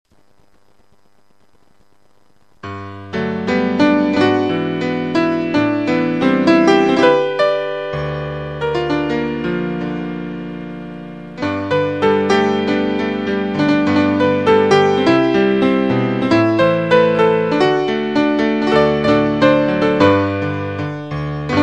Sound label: Christmas Piano Jingle Bells